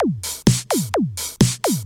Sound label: Housebeats, 128BPM; Vengeance Samples; VEH2 Loops